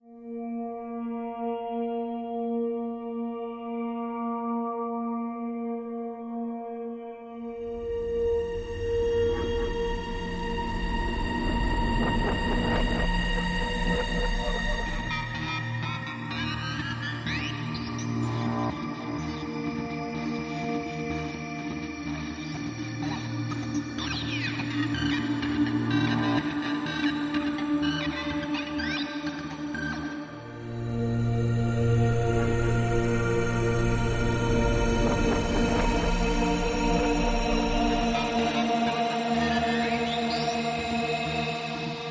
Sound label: Mission Drone No Piano